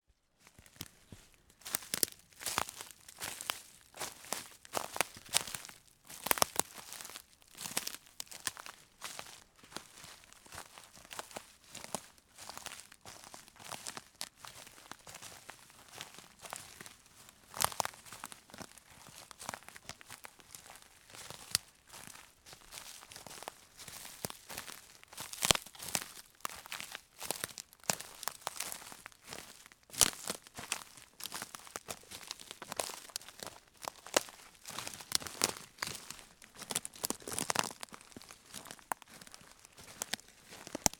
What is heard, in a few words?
Footsteps Grass